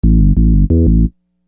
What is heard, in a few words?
Groove Bass, Hip-Hop Street Beats